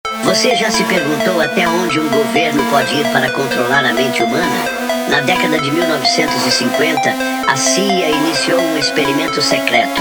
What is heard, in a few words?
phonk